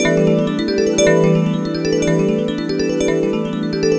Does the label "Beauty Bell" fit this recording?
Yes